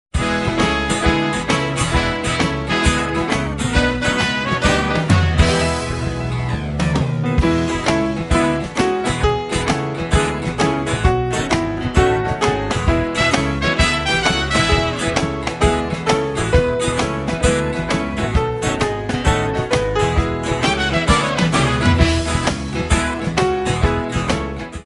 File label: the itsy bitsy spider instrumental